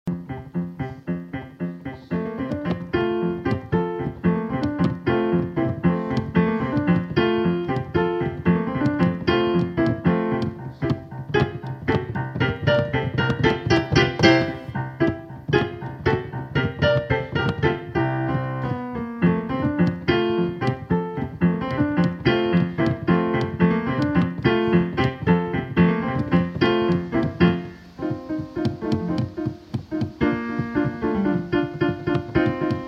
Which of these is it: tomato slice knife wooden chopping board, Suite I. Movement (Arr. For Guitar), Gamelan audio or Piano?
Piano